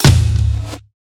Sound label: drum; smite; god raijin